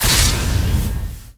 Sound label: berserk potion target; weapons; dota; alchemist